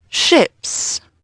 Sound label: ships; Dorling Kindersley My First Incredible Amazing Dictionary